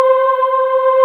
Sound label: VOICES, Roland JD8000; Sound & Vision - Gigapack I CD 2